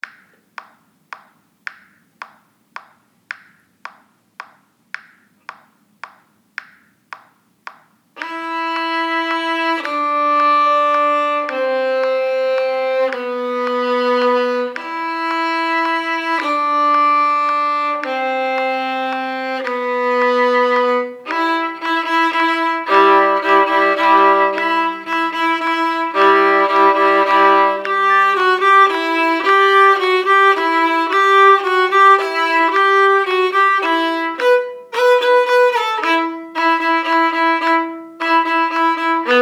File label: Ukrainian Bell Carol